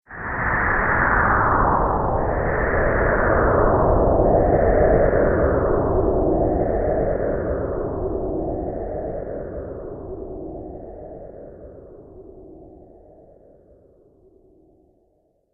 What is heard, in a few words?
Astral Winds, Science Fiction Sound Effects